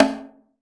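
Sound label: percussion, drum & bass, 180 BPM; Ueberschall; Drum & Bass Resonance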